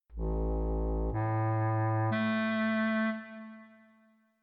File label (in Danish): hz toner; Spil med hertzsøjlen - Første møde med F og G nøglen